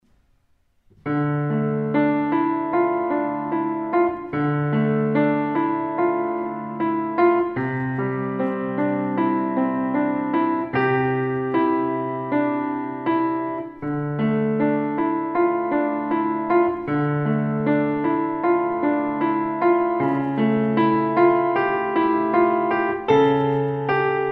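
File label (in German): Sanfte Wellen, Klavier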